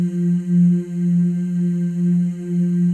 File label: FEM, Symphony of Voices; Spectrasonics